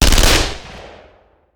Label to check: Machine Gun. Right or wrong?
Right